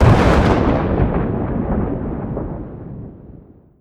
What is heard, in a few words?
bolt huge thunder